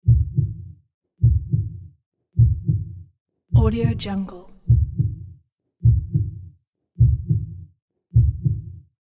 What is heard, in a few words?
heartbeat